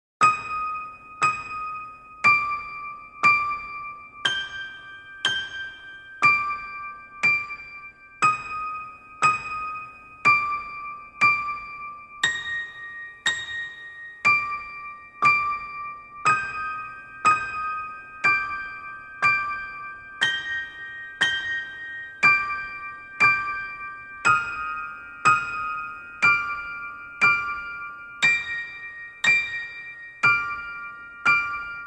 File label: Game Over Loop; Eternal Edge; Hero